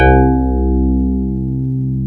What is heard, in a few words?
DX CRYSTAL C1, Electric Pianos; E-MU Formula 4000 Series Vol. 3; Analog Odyssey